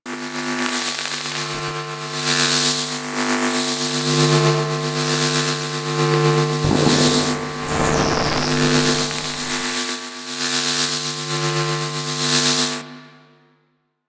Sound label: Static Screen; Ambience; Props; Warframe; Corpus Railjack